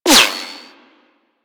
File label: Bullet Whizzby; Warframe; Weapons